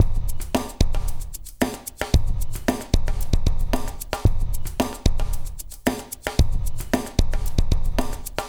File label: LQT AMB, Liquid Grooves; Spectrasonics; 113 LIQUITEK